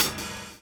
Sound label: DOWN, DOWN KIT 78